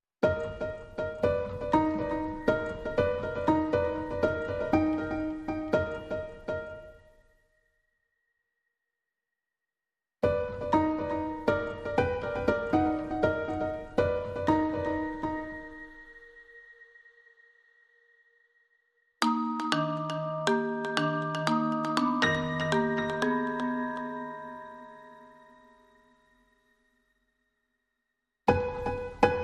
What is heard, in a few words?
Tiny Feet, Underscore